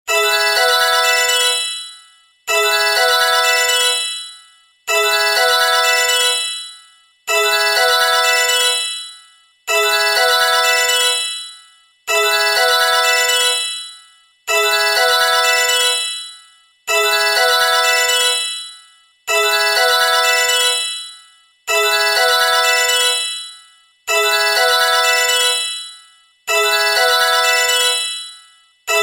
sony greeting

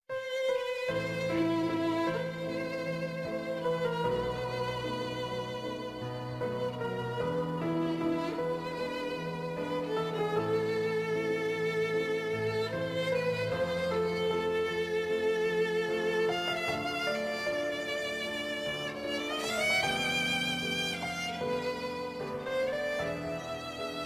sad classical music

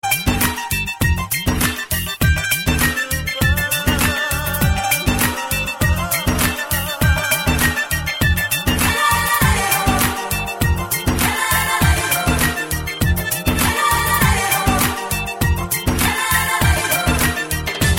ringer

